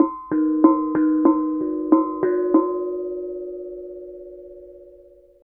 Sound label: KENONG; Spectrasonics; Heart of Asia; KENONG PH